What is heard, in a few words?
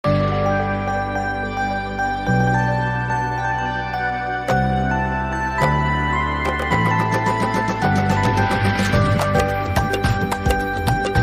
instrumental